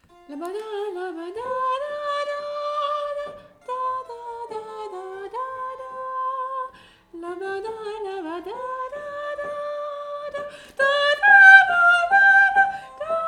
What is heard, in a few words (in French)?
Gavotte Tenor